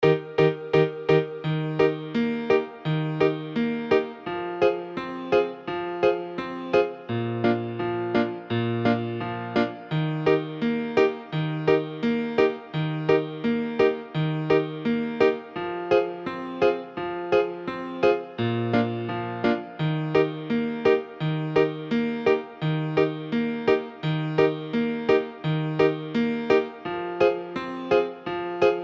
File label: song instrument